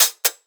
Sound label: hats, Pop; reset; Slate; SD PASADENA Pop Sample Pack